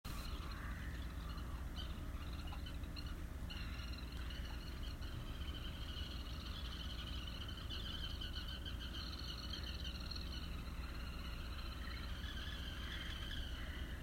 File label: Chirping Harbour